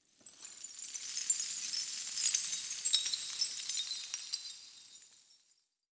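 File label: dream broken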